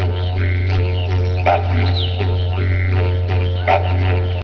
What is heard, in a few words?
didge